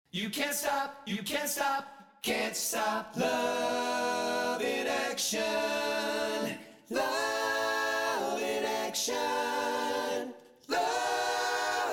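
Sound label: BV ORIGINAL REVERB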